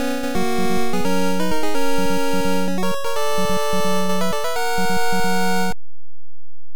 Check Point; Road Fighter; NES

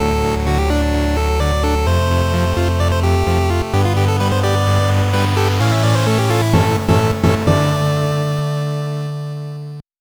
Complete Game Fanfare

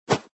Throw Baseball; android ios mobile online windows gamerip 2013; Papa's Pastaria To Go

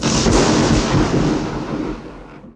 tornado lightning; lego ninjago rise of the snakes

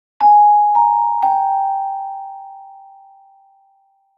Marimba

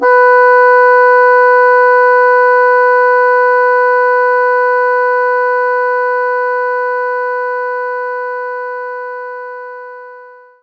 Bassoon B4; knights try

